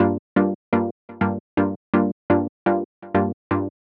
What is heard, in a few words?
deep house stab loop 124bpm gm; 2 bars